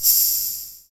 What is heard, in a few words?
PER TA, Percussion, Tambourine